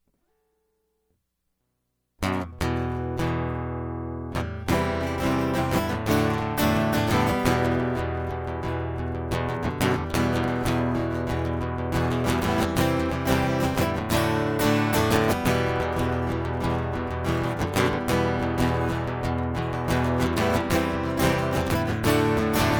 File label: Guitar Prequel